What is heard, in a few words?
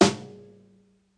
snare drum snares brushes